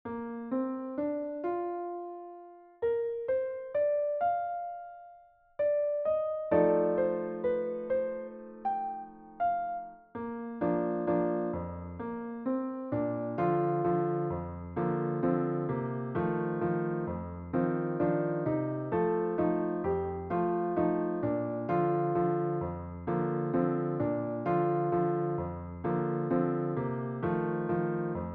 PIANO

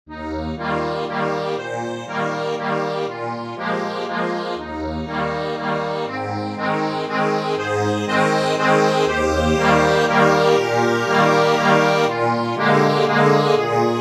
accordion, sheet music

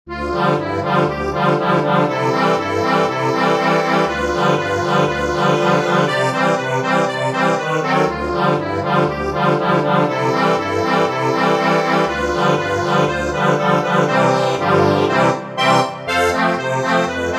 Accordion

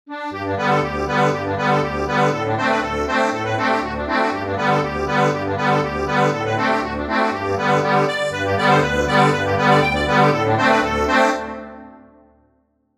circle accordion; Circassian circle

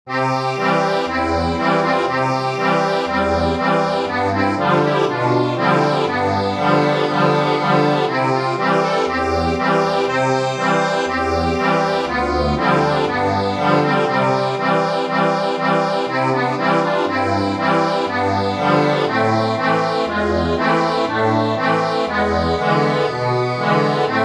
accordion

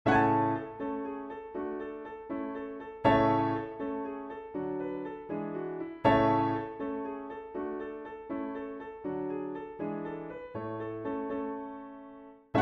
PIANO

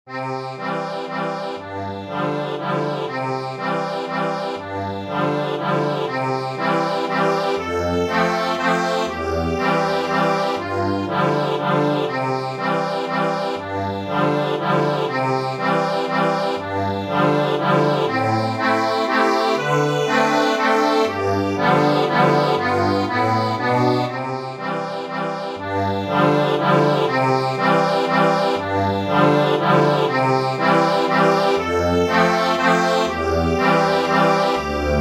accordion

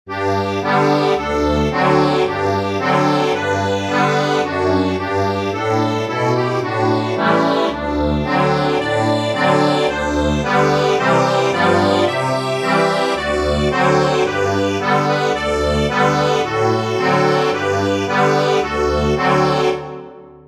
accordion

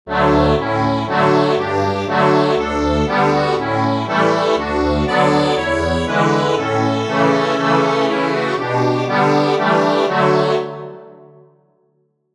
There is a green hill, accordion

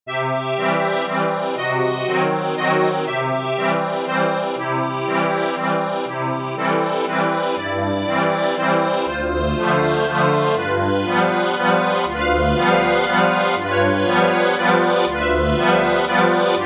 ACCORDION

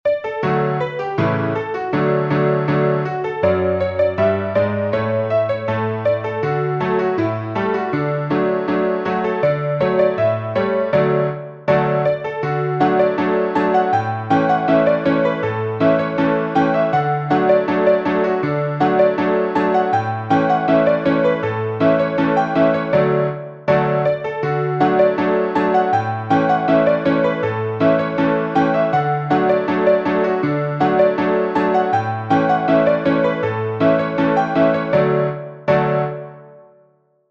PIANO

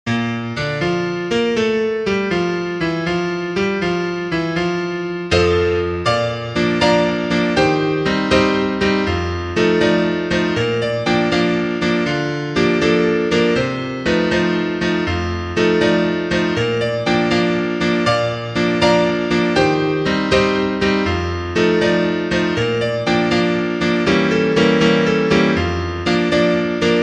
piano, sheet music

